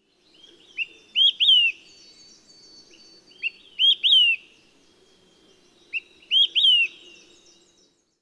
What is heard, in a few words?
Townsend's Solitaire